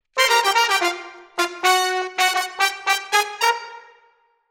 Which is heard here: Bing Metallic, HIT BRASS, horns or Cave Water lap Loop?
horns